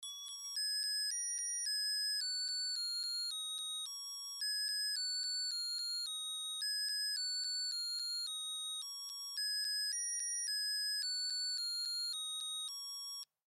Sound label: Baby Toy Twinkle Twinkle Little Star Melody; Musical; Blastwave